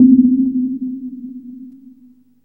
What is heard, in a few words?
NOISE BLOCK, synth perc; Ambient; Zero-G; Ambient 1